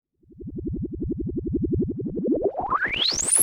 SPACE FX 140; SpaceSounds

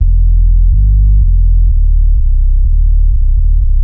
DEEPHOUSE BASS 125 A

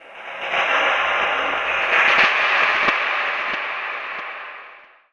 TECHNO FX 140

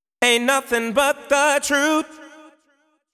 deep house male, 125, A, vocals

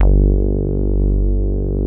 SOUL BASS G2

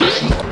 MUDDY SNARE; ELECTRIC-SET

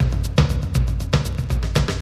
toms 120, drum; BREAKS

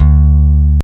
FINGER D-3, ELECTRICBASS

